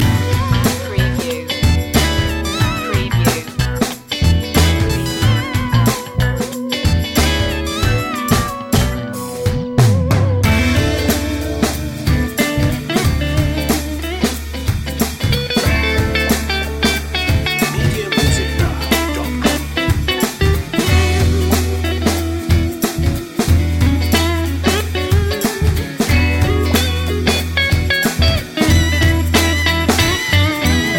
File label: funky loop